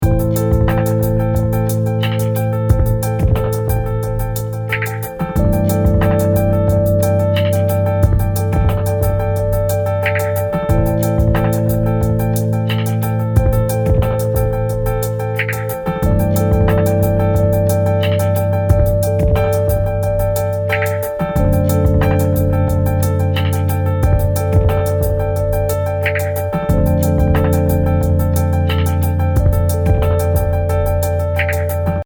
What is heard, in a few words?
menu Loop